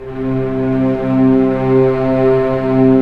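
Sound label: violins, piano, orchestra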